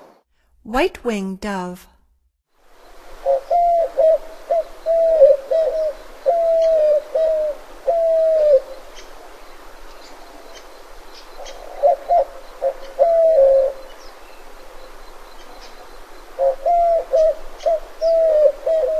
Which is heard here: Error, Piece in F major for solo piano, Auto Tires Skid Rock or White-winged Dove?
White-winged Dove